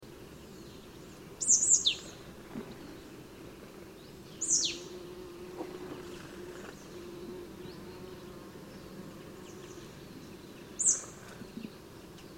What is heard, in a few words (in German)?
Sumpfmeisetyp Ruf